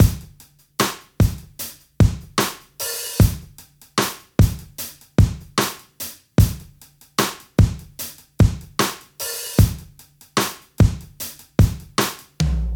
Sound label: Drums clean